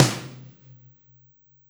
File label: Snare Shard; ZeroGravity Drum Shards